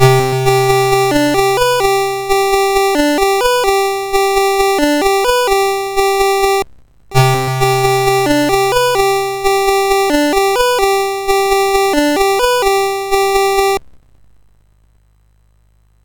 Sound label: sid MOS6581R4, GAMES; Forest of Doom